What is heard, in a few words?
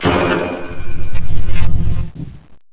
alien door